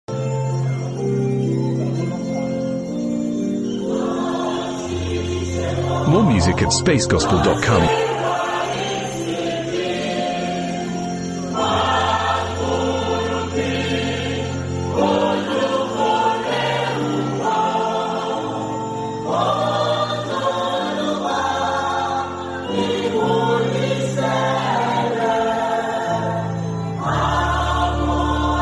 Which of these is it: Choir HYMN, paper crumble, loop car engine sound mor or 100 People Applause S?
Choir HYMN